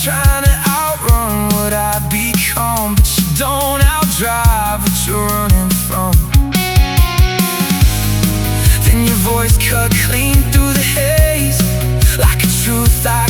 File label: rock song